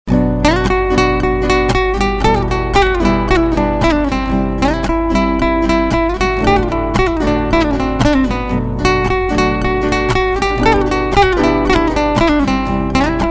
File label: guitar music ringtone